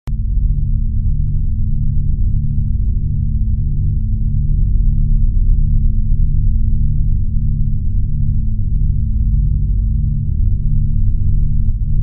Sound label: SHIP; ecrins; Mars